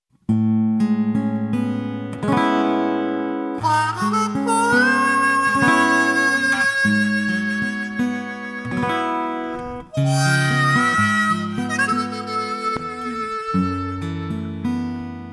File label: harmonica